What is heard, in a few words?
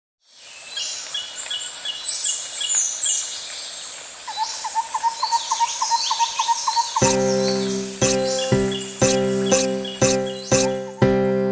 backing